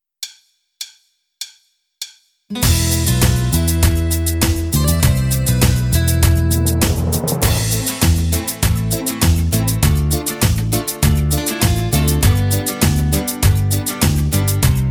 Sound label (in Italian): Cumbia Fox base